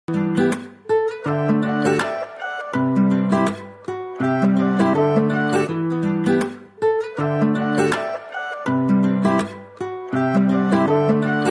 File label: guitar ringtone bgm ringtone